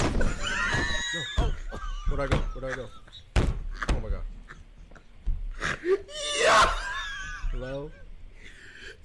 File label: wheeze snort; caseoh